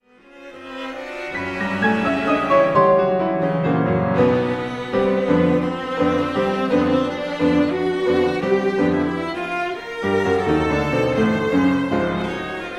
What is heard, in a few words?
piano quartet allegro moderato